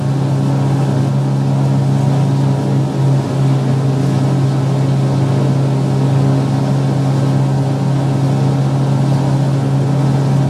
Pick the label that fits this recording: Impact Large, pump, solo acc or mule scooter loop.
mule scooter loop